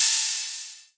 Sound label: Open hat, retro house samples, Drum Hits